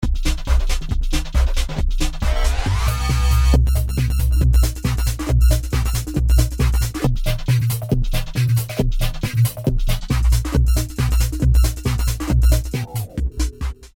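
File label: Due to COSM’s Comb Filter that is synced with the tempo, a unique effect is applied to the hi-hat. An experimental techno/house sound.; V-Synth; Harbor View (Beats)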